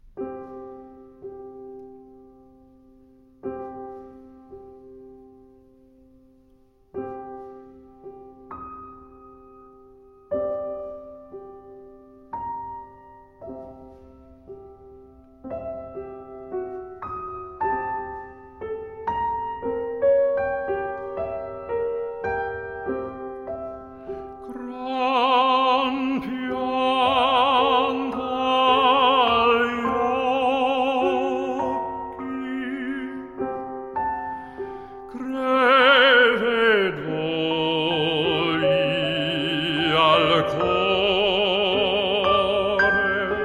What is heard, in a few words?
songs; three songs; gram piant agli occhi